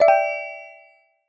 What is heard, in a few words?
multimedia notification mallet positive with slightly harsh resonating; zapsplat